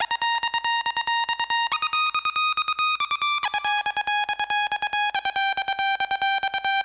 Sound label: Trance F2, Techno Melody; Techno Music Maker